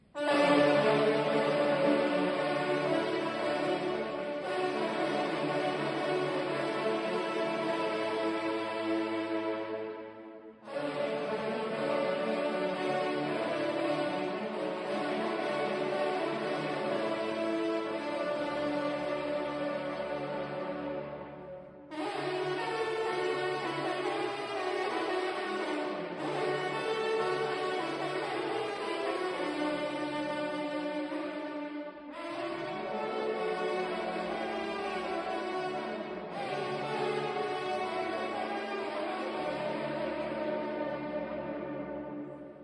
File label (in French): Clocher de Dampierre, concert gueux; Au Cerf Va Aux; concert de gueux 2023